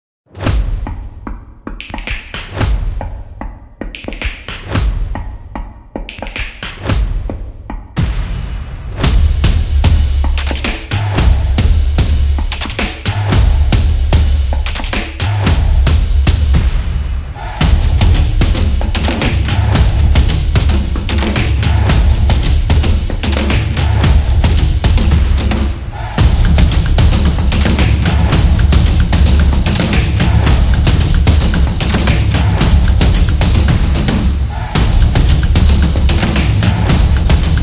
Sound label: Gaming intro; football promo